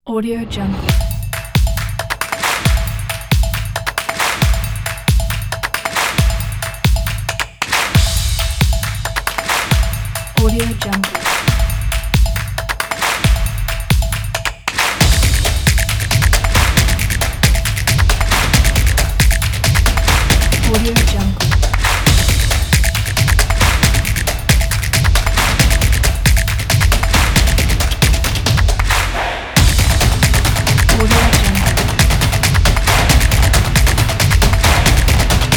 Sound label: sport drums